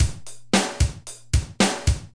machine; SGI O2 Out of Box Experience; jungle Island; Cyber Gourds

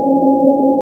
Indus; Revista CD Expert 50

